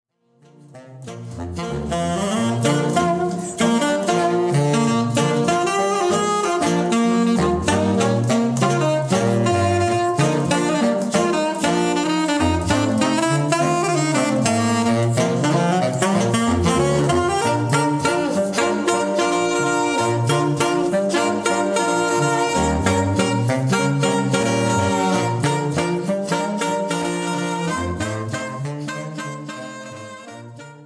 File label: 2 Sax Rock